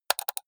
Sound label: computer mouse left click double hard